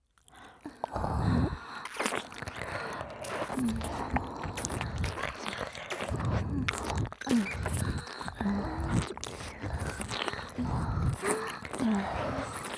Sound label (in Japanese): 吐息×喘ぎ×耳舐めBGV; ローズ